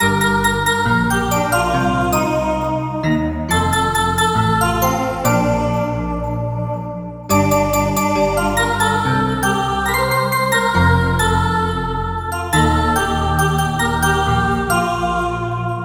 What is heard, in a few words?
bell synth